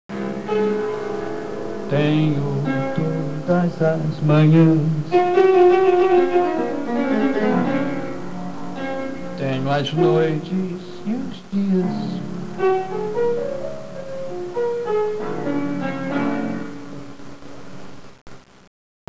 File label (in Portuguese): piano e cantada